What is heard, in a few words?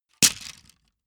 wood sticks small break